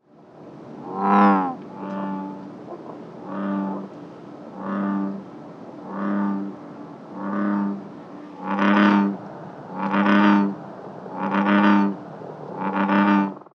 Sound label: american bullfrog call; Parks Recreation